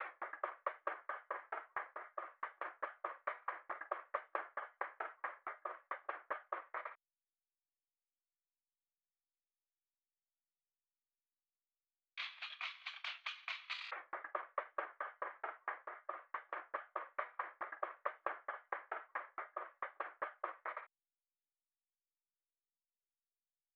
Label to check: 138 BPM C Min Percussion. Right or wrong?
Right